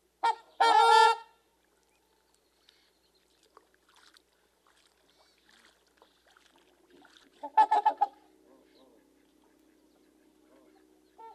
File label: trumpeter swan call